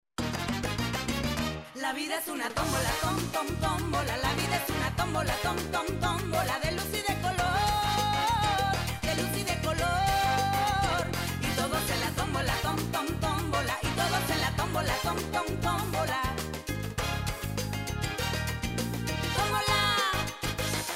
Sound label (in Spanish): theme salsa voz; Tombola Theme vocal (Salsa-Latin)